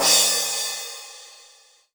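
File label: cymbal, left; Best Service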